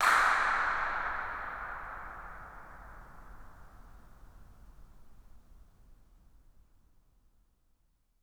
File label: incinerator dirac